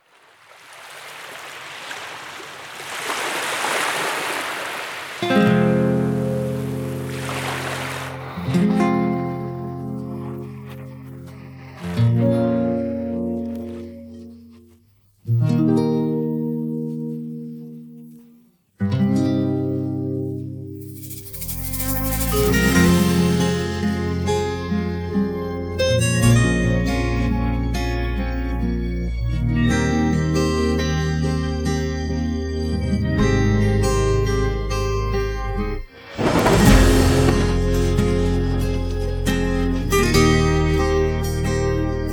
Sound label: Guitar